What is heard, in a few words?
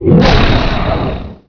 attack, shub